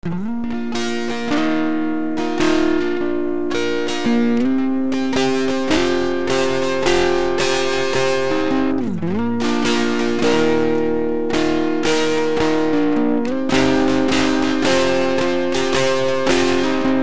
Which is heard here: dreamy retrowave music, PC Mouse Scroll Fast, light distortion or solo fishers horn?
light distortion